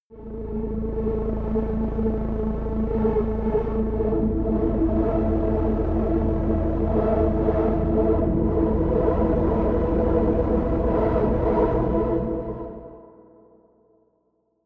drone hovering; musikelemente; drones

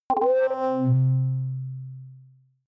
click ready boot

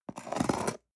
cutter cardboard open soft; bueroarbeit

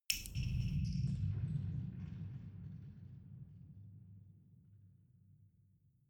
dripping; atmosphaeren

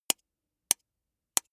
soda can tap finger soft; sport freizeit

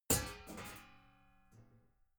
metal sheet crash light; metall

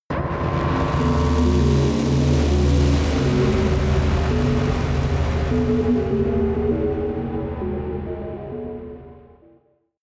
buzz crying bells, drones; musikelemente

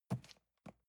cardboard box medium grab soft; objekte

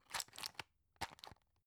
drug close hard; berufe; arzt

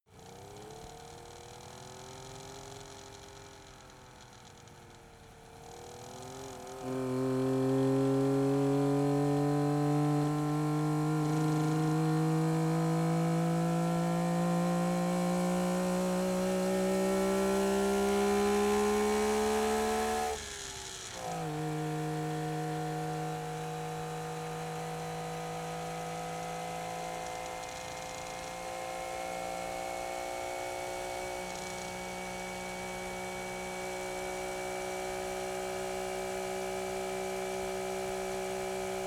car saab 92 accelerate slow engine

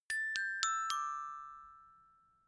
notification glockenspiel dry sequence descend

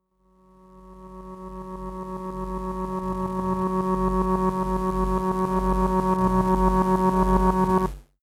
gate reverse noise swell; atmosphaeren umwelt; digital

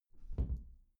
glass door close soft

